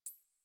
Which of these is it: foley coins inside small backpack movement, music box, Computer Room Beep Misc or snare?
Computer Room Beep Misc